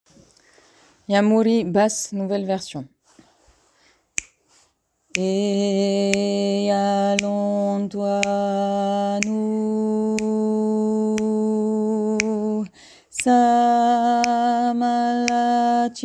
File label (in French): basse